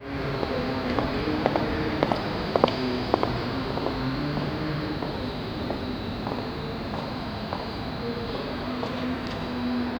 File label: cinematic slow motion city